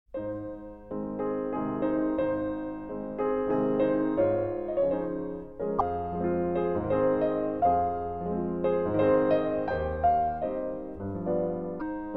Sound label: Waltz in A flat major; Johannes Brahms